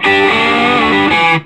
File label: SOLO G, Vintage Blues Guitar; USB Soundscan vol.22